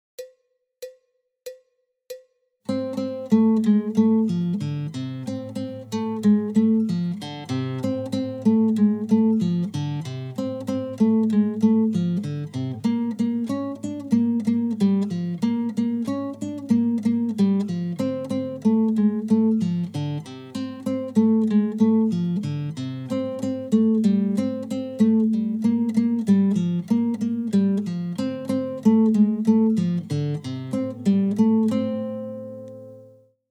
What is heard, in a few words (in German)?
Gitarrenschul Blues